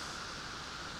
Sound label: Background Noise